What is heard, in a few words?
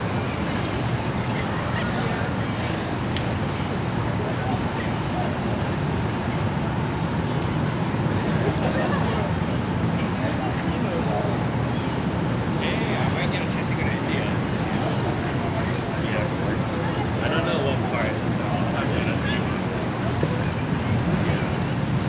Park Ambient; Menil; HOUSTON